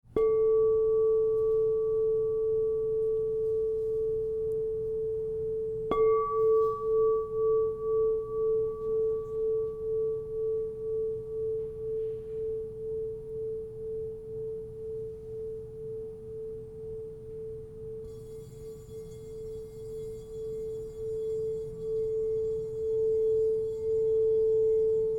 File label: Crystal Tones Singing Bowls